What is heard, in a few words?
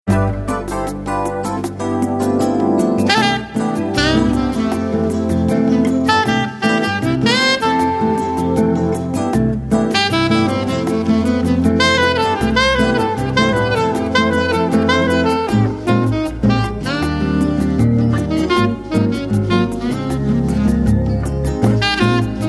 shaker song